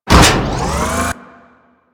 Injection Arm Mover Start; Ambience; Warframe; Grineer Ocean; Ocean Sabotage Injection

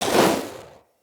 Elemental Fire Arrow Shot, Bows; Weapons

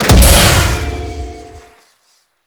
Ogris Shot, weapons

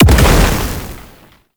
Bow Projectile Fire Explosion; Ultimate Abilities; Madurai; Warframe; Focus

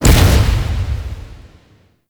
Spin Beam Explosion; Enemies; Weapons; Warframe; Tau; Sentient Dactolyst

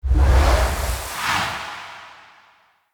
Wyld Decoy Cast; Fey; Saboteur

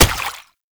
Proto Infested Step Water; Warframe; Brachiolyst; Steps

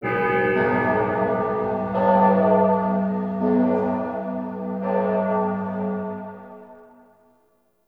Hub Stinger; Tau; Protoframe

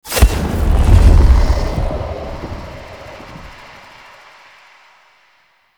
Stag Blast Impact; Powers

